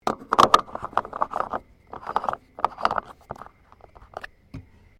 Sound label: Car Subaru Liberty 2001 exterior petrol cap close; Vehicles; Family members Subaru